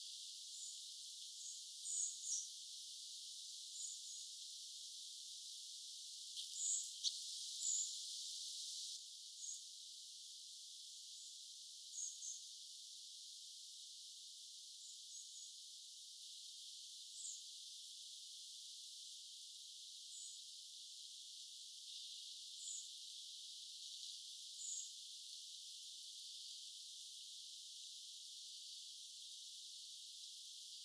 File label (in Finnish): kooste mahdollisista ja oletetuista sinihohtorastaan aanista